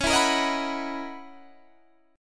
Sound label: Gb 13th chord shape